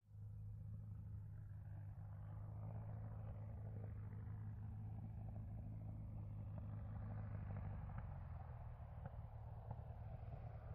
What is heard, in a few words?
Engine Unsteady, Speeds Up Slightly With Flyby At Low Altitude, Steady Away, Speed Up In Distance. Medium To Distant Perspective. Prop Plane, Tiger Moth